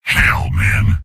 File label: soldier negative vocalization; mvm